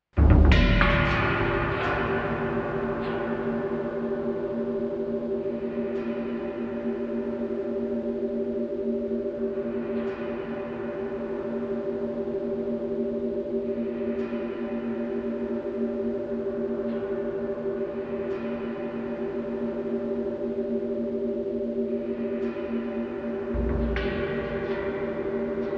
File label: drum organ